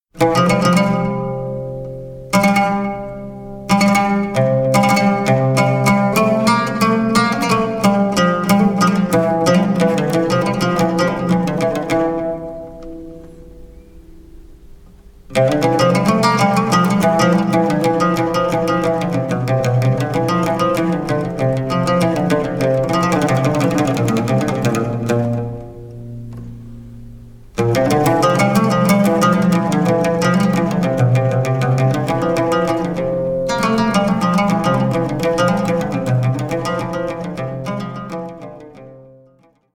oud solo

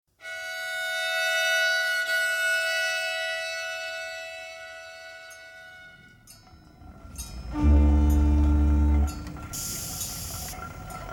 polskan

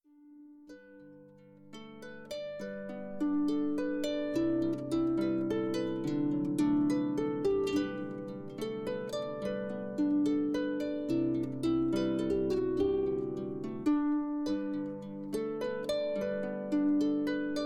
waltz; inisheer waltz

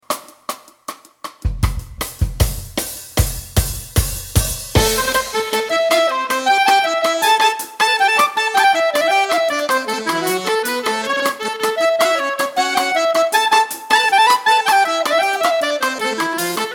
polka; linda polka

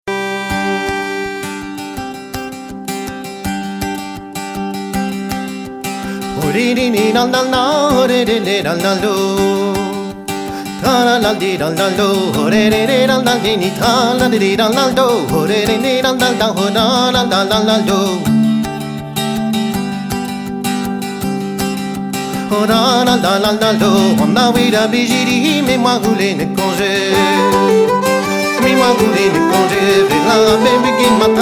suite plinn ton double

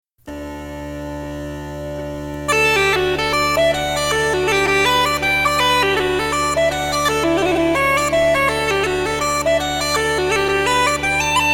hornpipe; the derry hornpipe

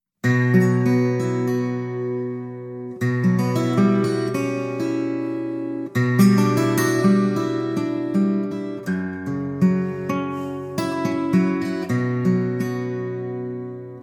melodie; melodie kozh

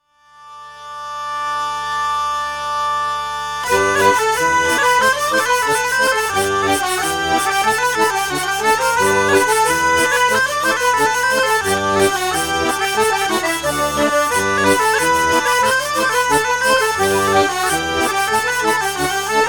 scottish polka

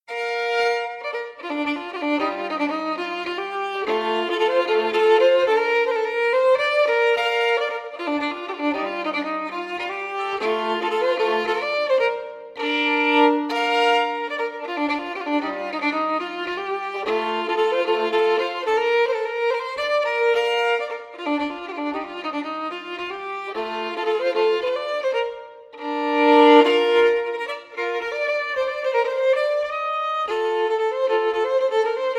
polska; komp svens polska av komp sven

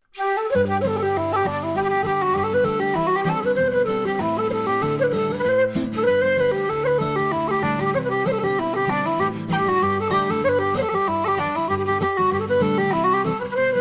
connemara jig set, irish traditional dance music; fig 4 bantra

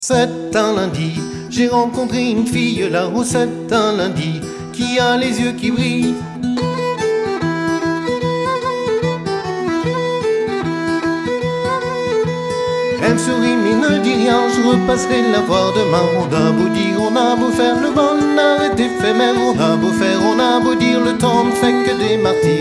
rond paludier

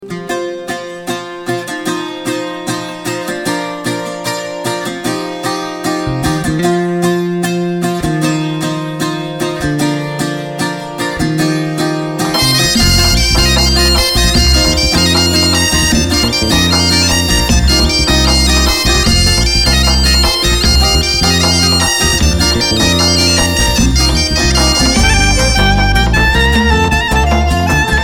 polka

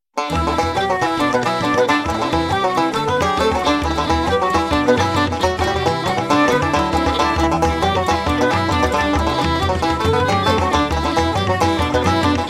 connemara jig set, irish traditional dance music; fig 2 pull

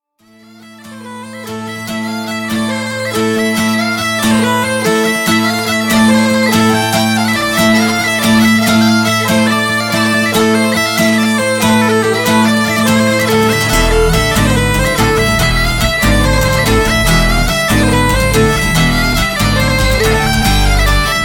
maraichine

